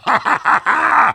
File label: laugh happy; Heavy